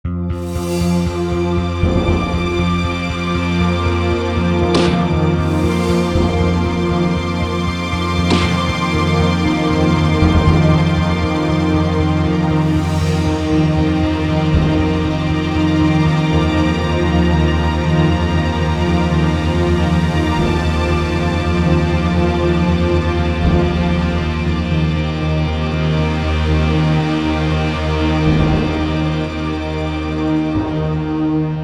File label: Ambient Music; command conquer red alert mobile unofficial soundtrack mobile gamerip 2009